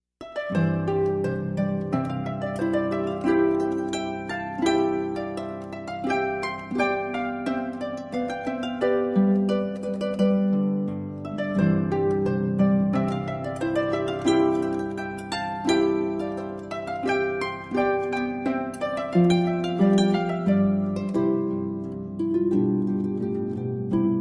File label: Baroque Fanfare